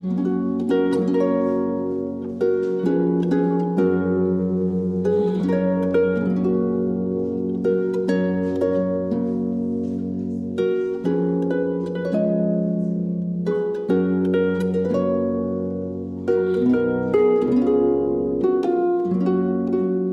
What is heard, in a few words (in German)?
harfe